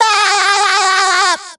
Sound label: Baah